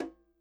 KILLER CONGA

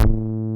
SPIKE BASS; Masterbits; Dance Trax

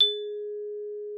XYLS G#4, VIBES; Xsample VOL-4

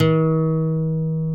GTR, Nylon Chorus; Roland LCDP02 Guitar and Bass; Nylon String

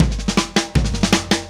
Happy Pub Beat Intro, Indie Rock; Sonic Foundry (Sony Creative Software); Indie Rock Anthems; Session 05 - Happy Pub

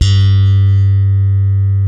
Bass, G 3, ALL THUMBS; Spectrasonics; Bass Legends

